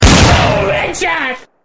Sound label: BOOM; HEADSHOOT